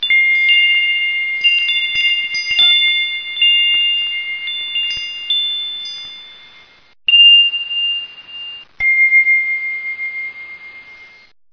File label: chimes